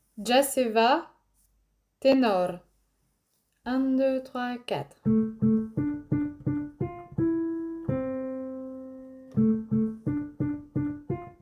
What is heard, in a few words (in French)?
Ténor